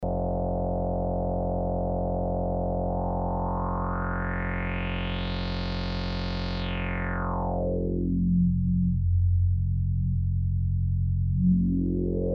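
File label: Sonicsmith side chain VCF env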